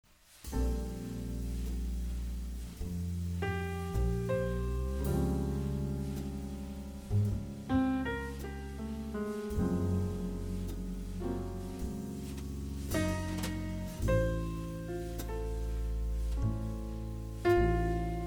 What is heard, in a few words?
trio